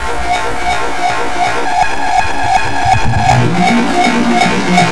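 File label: FX REVERSE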